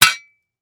Industry Tool Impact Metal Shovel Small Hitting Dirt